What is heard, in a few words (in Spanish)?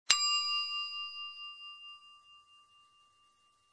servicio de botones